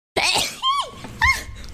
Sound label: sneeze